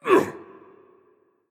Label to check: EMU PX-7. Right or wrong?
Wrong